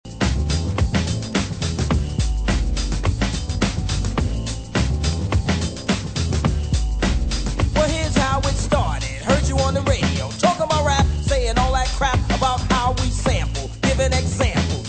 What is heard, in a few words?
extended vocal